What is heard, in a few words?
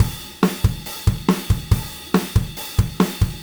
Drums Rock Chorus, loops; Alex Sanfilippo